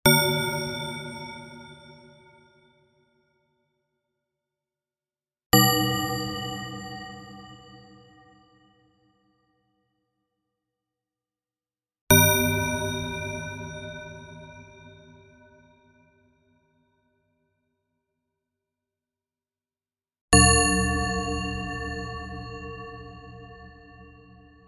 Discordant Chime With Long Decay

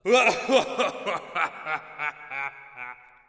Laugh crazy

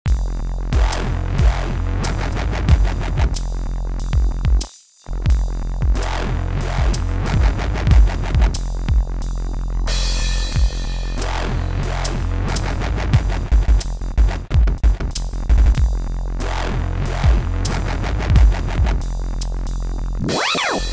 Messy Dubstep Loop

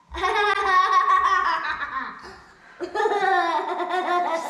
Child S Laugh

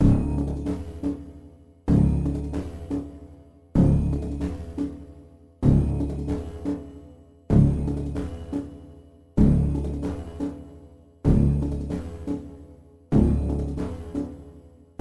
Drum Loop

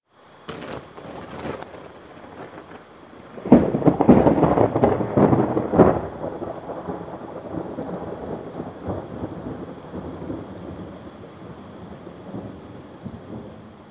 Tearing Thunder

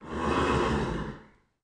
Redbarn Horse Nicker

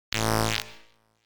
Long Crackle

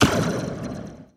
Gun Plasma

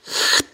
Suck Pop

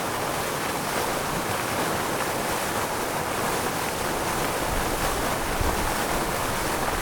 Fountain

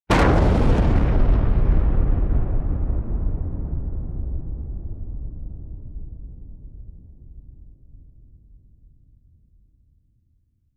Explosion Blast

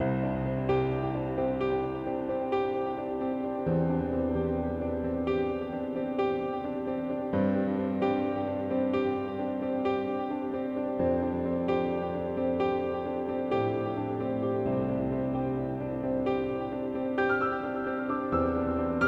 Uplifted

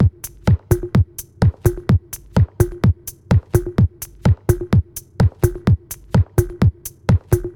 127 Bpm Light Reverb Drum

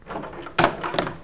Door Post Opening

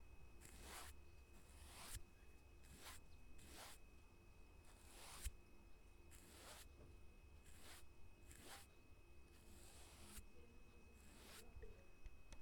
Painting And Brushing Sounds

